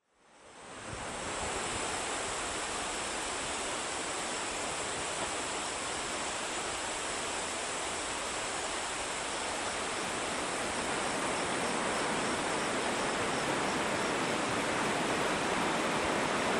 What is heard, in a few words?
Soundscape Rio Grande